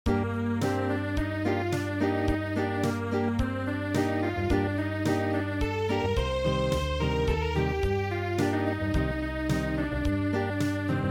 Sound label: Acoustic, traditional